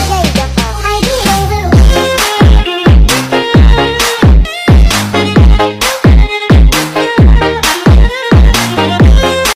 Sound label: Iphone 14 Pro Max ringtone